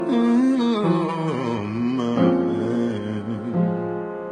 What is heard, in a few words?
low mm